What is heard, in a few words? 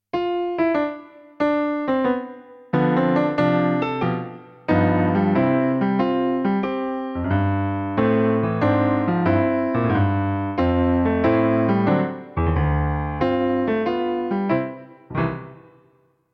karaoke